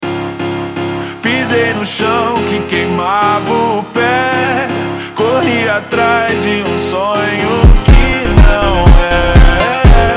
Phonk, Slowed

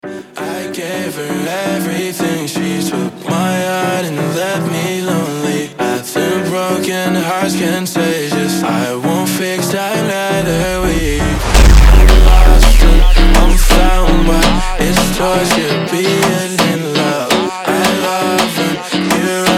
Phonk FUNK Super Slowed

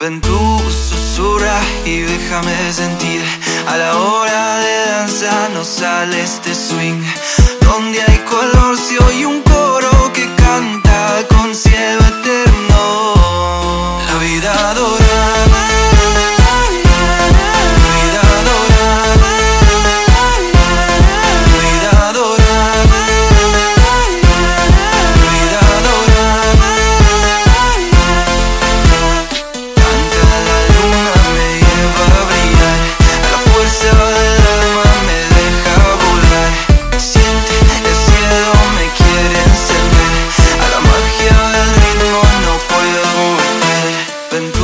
Phonk Montagem

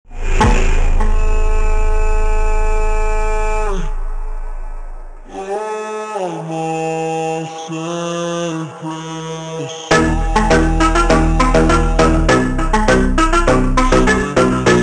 Phonk

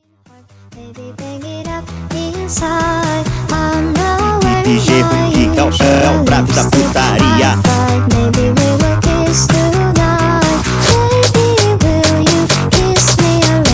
Phonk

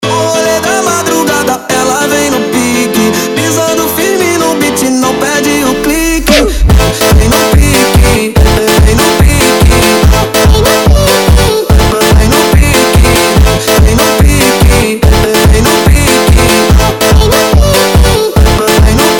Phonk Sped Up

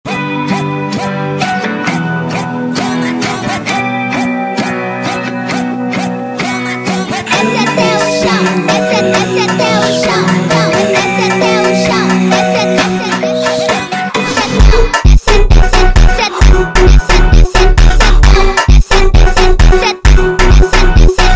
Phonk funk

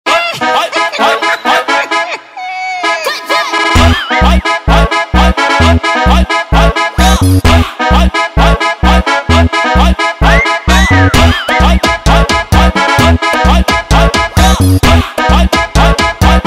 SANFONA FUNK

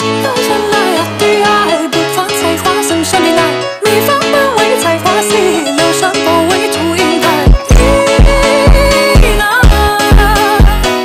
Phonk Slowed

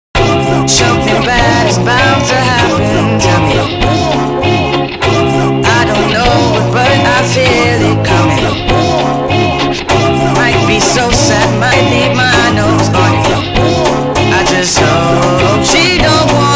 Phonk Slowed

